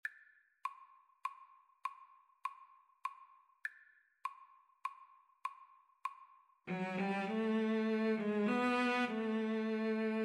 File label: Cello Duet